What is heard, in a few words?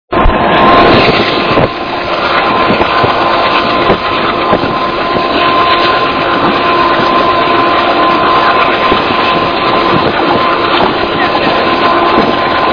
ABB turbo